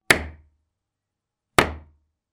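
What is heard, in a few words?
hit sound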